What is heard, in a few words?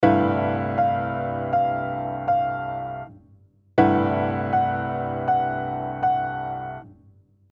Overtone Series